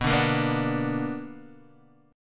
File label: B Minor Major 7th, Guitar, DADGAD